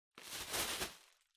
Bubble Wrap Rustle; 気泡緩衝材; ガサガサ; 梱包材

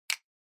Finger Snap Foley, Dry

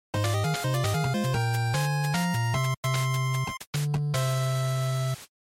NES Action Clear

